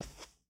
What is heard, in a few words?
Foot Carpet Shoes Walk; Lucius III